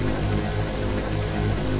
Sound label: wasp; PC Gamer IT CD 41